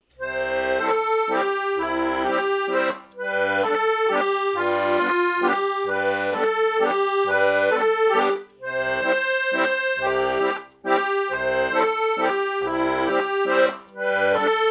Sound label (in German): Landler; Anfanger